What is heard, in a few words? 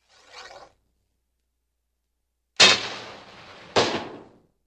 Shooting Arrow with Bow String Pulled Back, Release, Arrow Fly & Impact In Distance; Could Also Be A Flare Or Fireworks